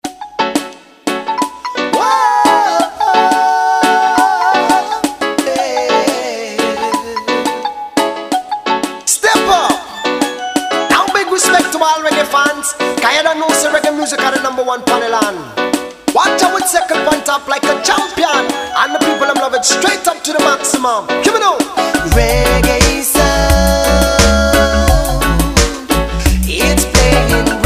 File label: Reggae Sound